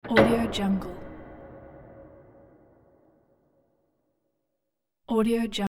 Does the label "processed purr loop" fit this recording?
No